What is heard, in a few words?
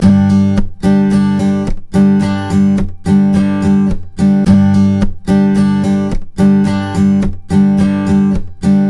Blues Guitar Samples, RIFF; ILIO; Fretworks